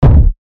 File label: wooden thump